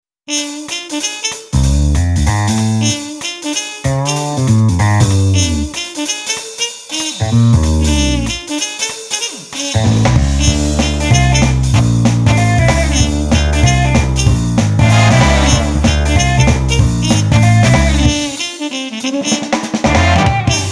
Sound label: Happy Kind Of Rhythm, VST труба; Screaming Trumpet Pro